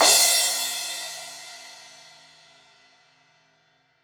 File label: Crash 16in edge, Cymbals; Deeply Sampled Drums